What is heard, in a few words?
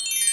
move art